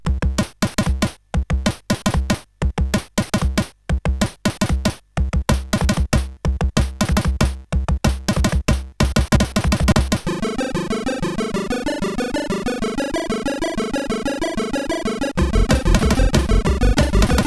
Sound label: Happy Hardcore, CSG8580R5